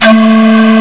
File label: pan; fish; workshop